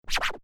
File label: Turntable Scratching; DJ (Sound Effects:Musical)